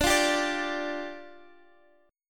D-Minor Double Flat 5th, D, Guitar, Open D

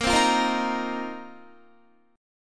Bb Suspended 2nd Suspended 4th, Guitar; Bbsus2sus4 strummed

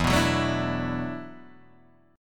E 7th Suspended 2nd Suspended 4th, Guitar, DADGAD; chord shape

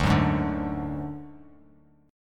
D Major Flat 5th D Bass Standard, chord; strummed; JGuitar

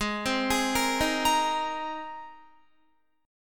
Ab Major Add 9th Ab down Guitar; Abadd9 arpeggiated; Abadd9 chord; JGuitar

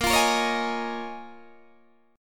Bb Minor 11th Bb down Guitar DADGAD

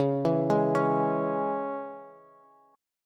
Db Minor Db down Bass; arpeggiated

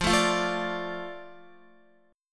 F 6th F down Guitar; F6 strummed

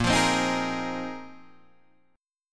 A sharp 9th Suspended 4th A sharp, Guitar, Open D; 8,8,10,9,11,8

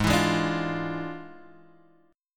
E 7th Flat 9th G sharp, chord

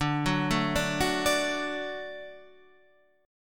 D Major D down Guitar Standard; D arpeggiated; JGuitar

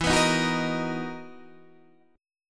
B Major 7th E

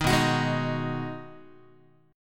B 6th B down Guitar 4A 4E 5A 5D 5G sharp 6C sharp; strummed

